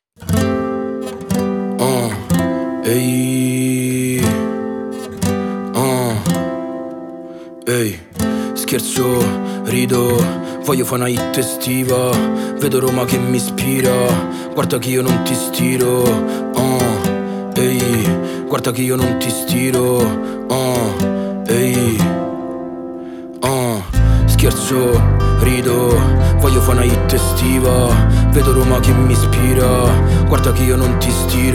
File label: acoustic